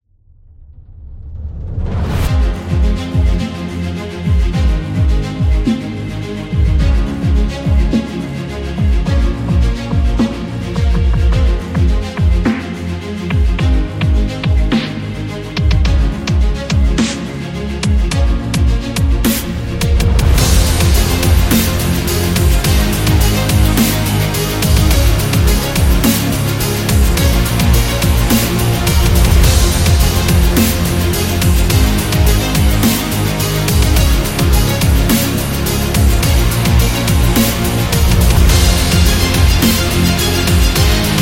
Epic Dubstep Trailer

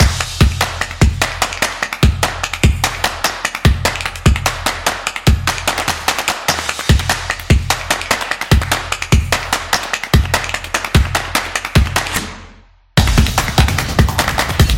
Snowboard, sport drums

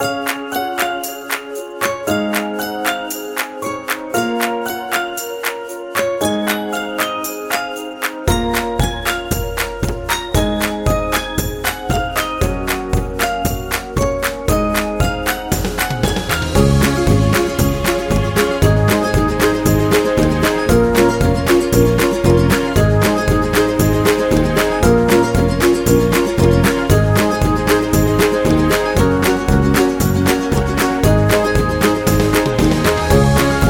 Happy Birthday Day, ukulele

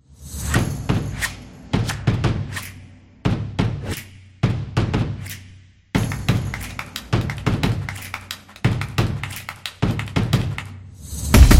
Beat Fast; fast tempo

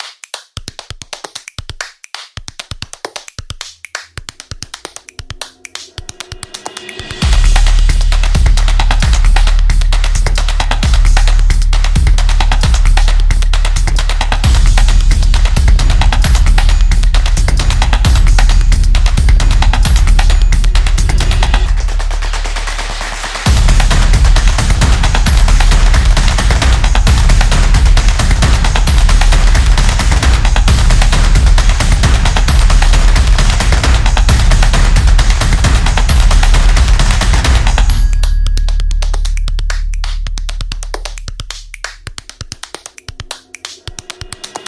Fast Drum; high energy

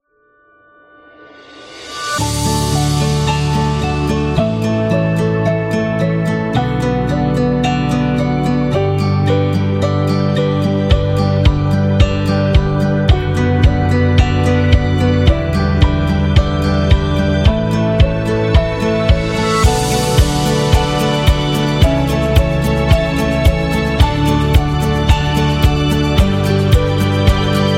Cinematic Love

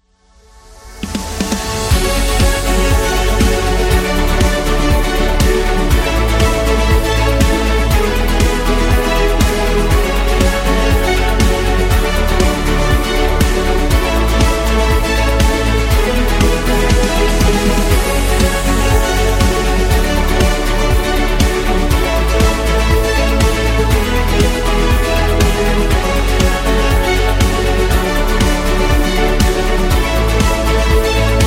Motivational; corporation

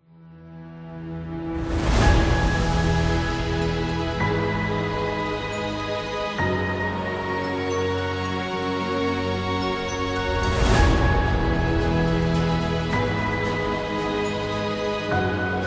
Uplifting Storytelling

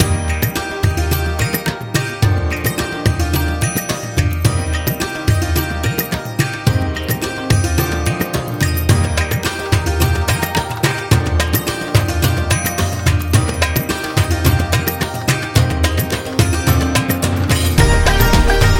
India Happy

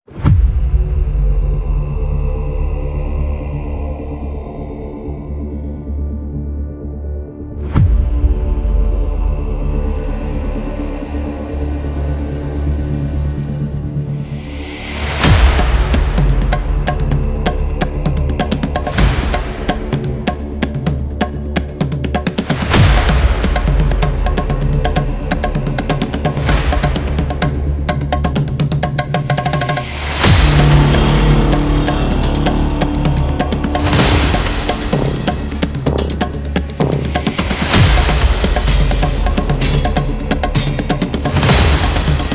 Battle Cinematic Trailer

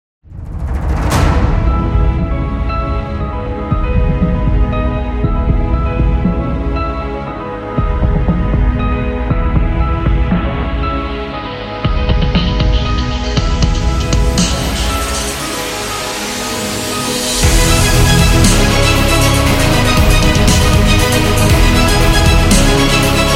Epic Promo

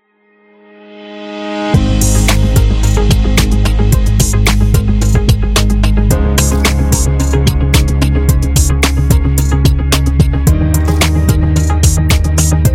Corporate Real Estate

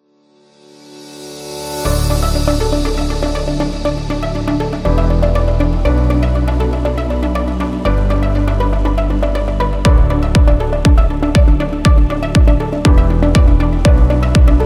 On Hold Music; inspirational motivational

